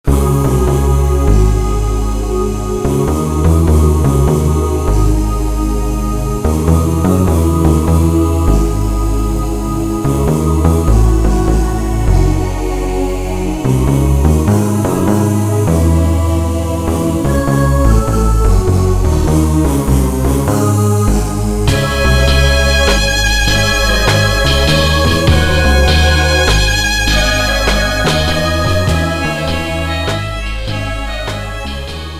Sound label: violin concerto no.2 2nd m.; Bach’s Violin Concerto No.2 2nd movement BWV 1042